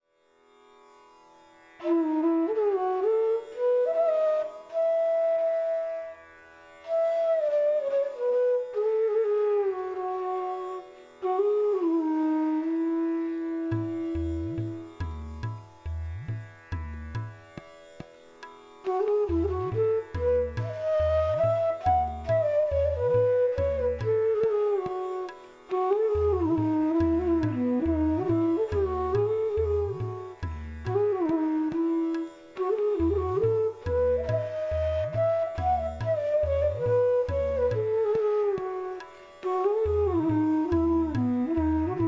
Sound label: Raga Des Flute; Bansuri Student Zone